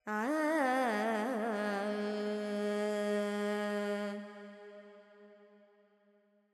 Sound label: FX Purvi Kalyani, Carnatic Female Alaps; Sample Banks